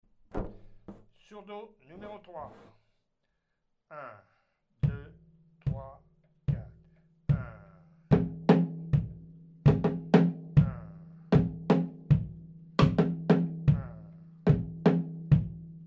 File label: Surdo